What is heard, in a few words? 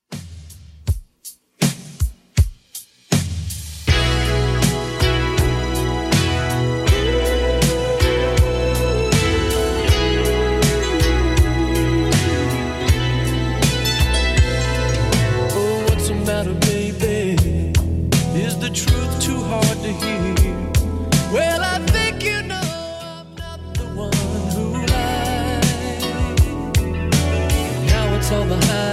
80bpm